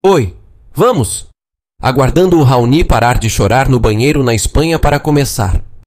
chorando

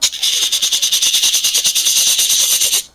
pressao panela

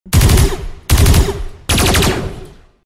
rajada

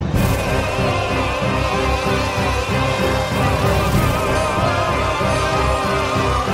musica boss theme

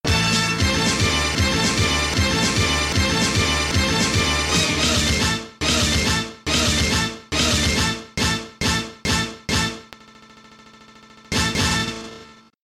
vinheta bugado

